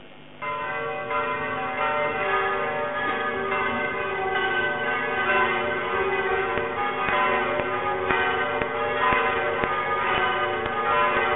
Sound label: Bell